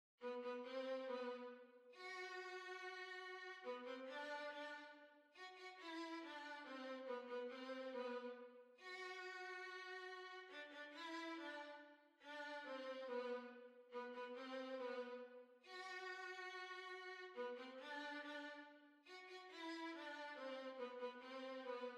Hornpipe, Violin

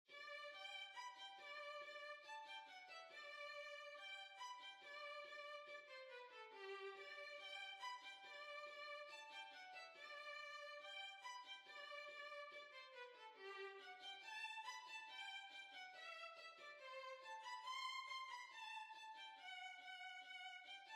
Hornpipe, Violin

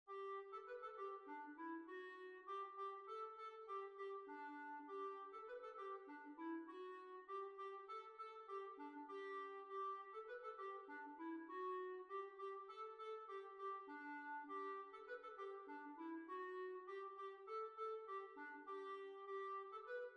German Dance

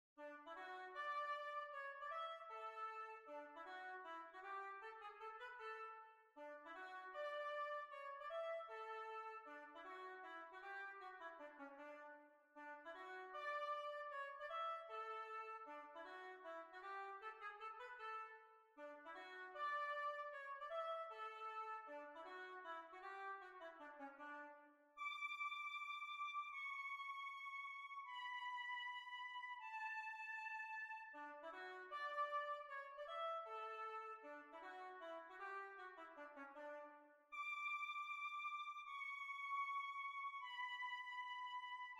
Hornpipe

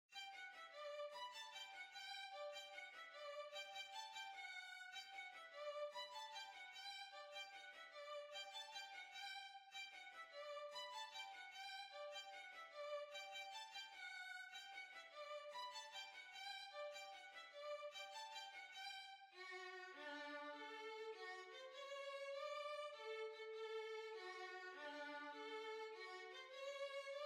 Violin

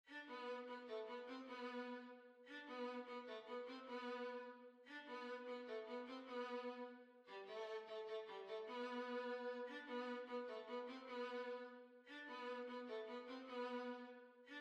Violin

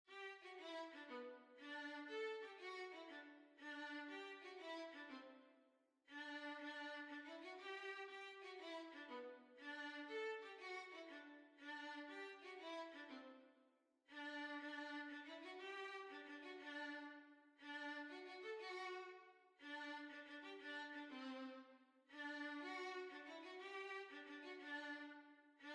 Violin

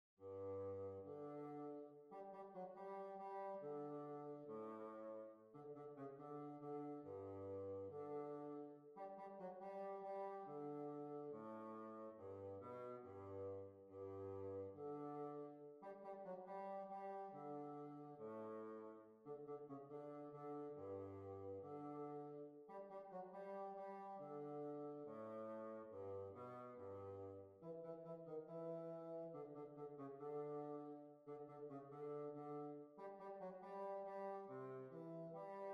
Hillebolla Schottis

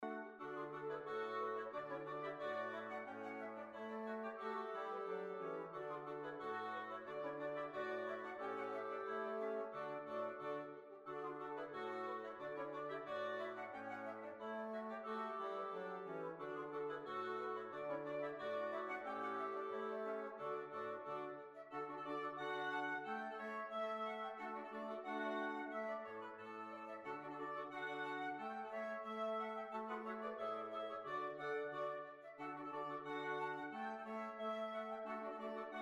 Hornpipe; Miss Richards Hornpipe

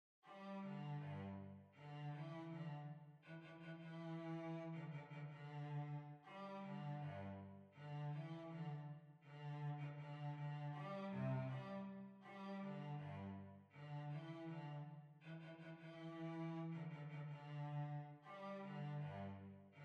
Hornpipe, Violoncello

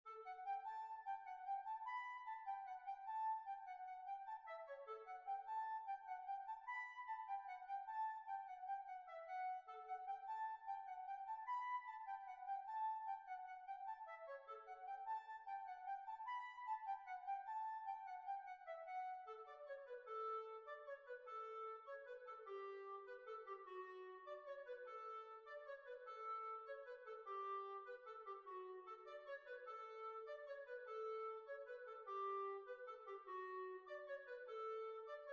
Jig; Sally Sloanes Jig; B flat

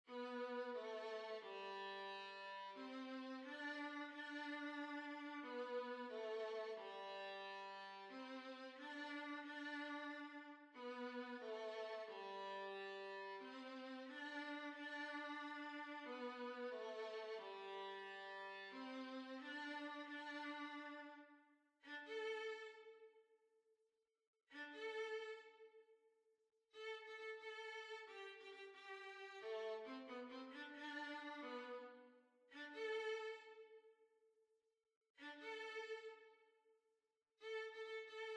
Violin

